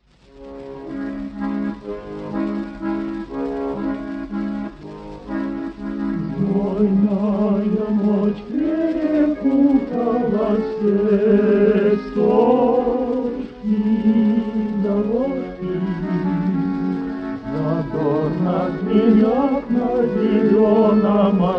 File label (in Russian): vokalnyiy oktet